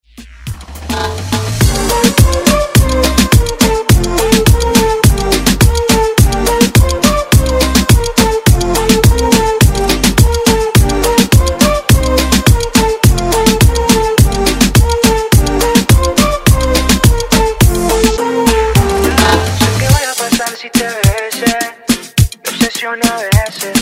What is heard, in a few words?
Reggaeton Redrum